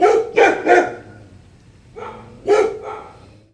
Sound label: dog